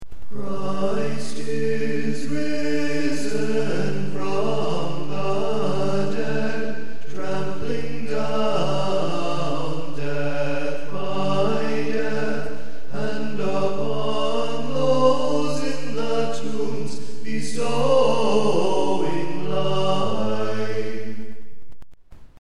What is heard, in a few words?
Paschal Trop Stereo